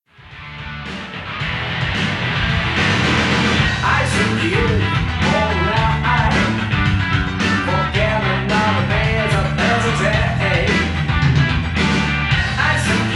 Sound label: Vocal ver.; Yakuza Kiwami; PS3, PS4, Windows, Xbox One